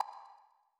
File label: Click; ichni Official; Wwise Project